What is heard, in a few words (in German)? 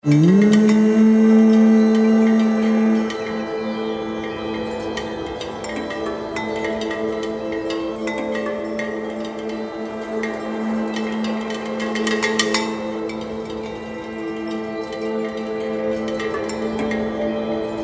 Klingelton Alp, Markensound; Allgaeu